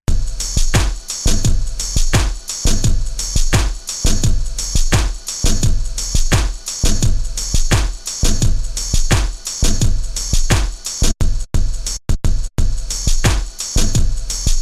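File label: Drum Loop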